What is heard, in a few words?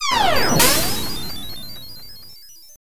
teleport; Sonic Mania